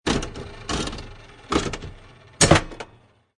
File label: Slot Machine Wheels Spinning And Stop; Casino, gambling, Slot Machine. Wheels spin and stop.